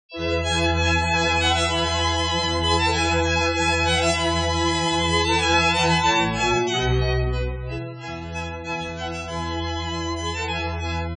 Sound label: sine